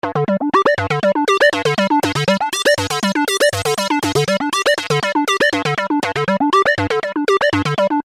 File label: Arpeggio 120 G, Techno Synth Loops; 120bpm; Sonic Foundry (Sony Creative Software); Signals I